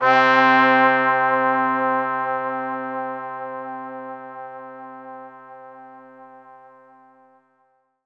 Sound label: C4, Synth Line